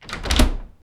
opening a old wooden door; doors

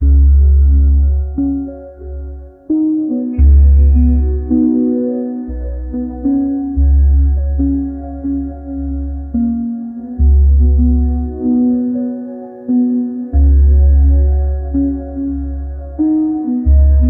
soft echoing piano notes, 78 bpm

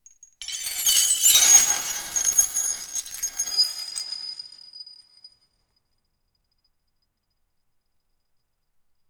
deliberate cut produces a, glass

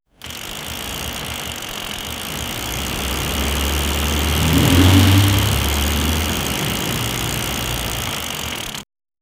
old bike sound; m80

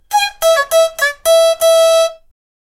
japanese traditional kabu; artistic

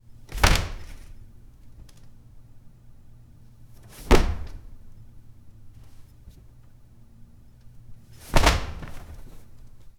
a realistic sound of mult, library

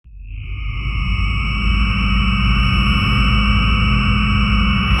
deep fluid merging sounds; psychic

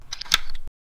an item becoming availabl, generic

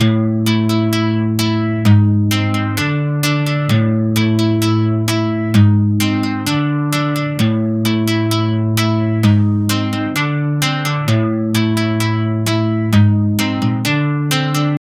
nylon guitar melody

